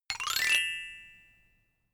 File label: notification glockenspiel slide up